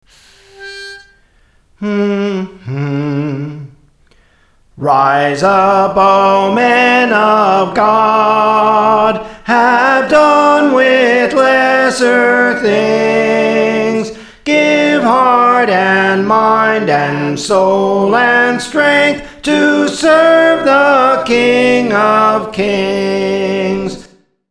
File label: Soprano; Praise For The Lord Training